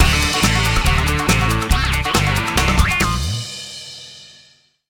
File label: (Jingle) Victory; rayman arena; Henchman 1000